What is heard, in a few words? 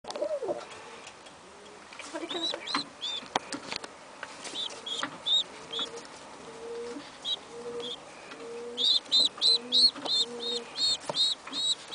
baby pigeon sound